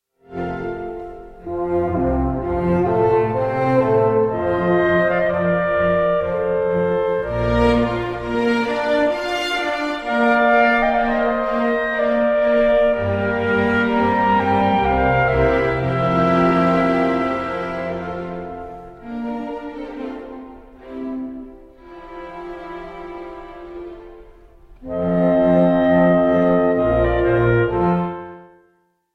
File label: Scottish chamber orchestra divertiment